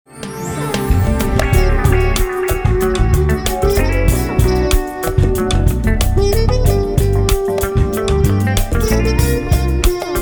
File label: JAZZ